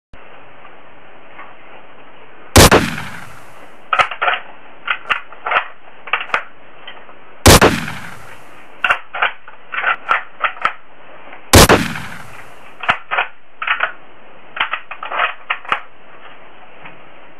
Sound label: Arisaka, تفنگ; تفنگ آریساکا